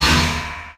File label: projectile, weapons; Dystopia; cyber